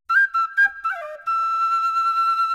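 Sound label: Flute, Hip Hop Exotica, A minor; Big Fish Audio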